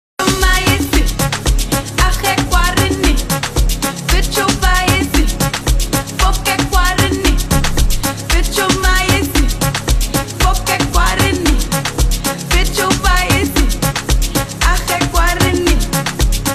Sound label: Amapiano Remix